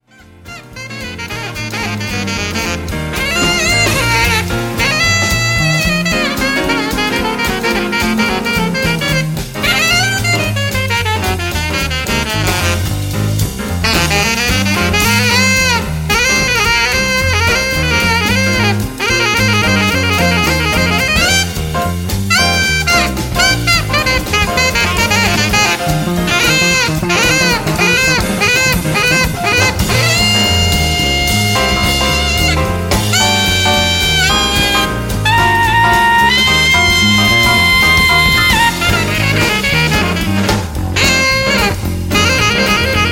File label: Blues Minor